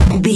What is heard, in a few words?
retro impact hit general